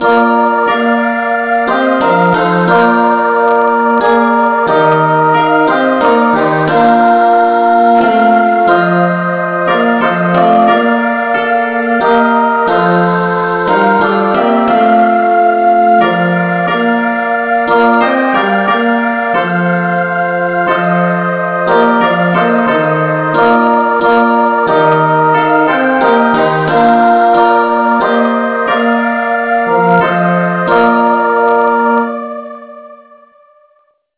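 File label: no vocal key B flat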